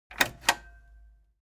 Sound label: object tape deck tape deck open